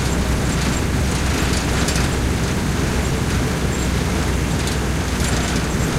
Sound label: m16 engine int rpm 33, tanks; engines; War Thunder